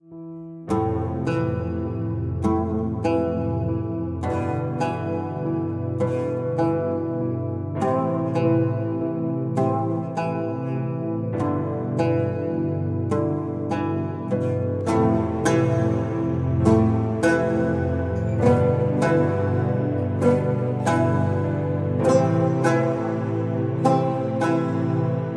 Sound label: Oud; Interstellar